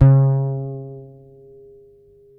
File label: HOLLOW FRTLS D 4, Bass; Spectrasonics; Bass Legends